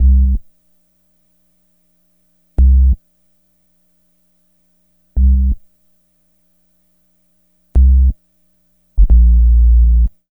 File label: Hip Hop and R&B; Best Service ProSamples vol.02; AKAI